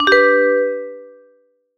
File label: Click